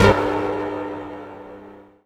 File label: ALIEN DRIVE; ENVIRN-AMB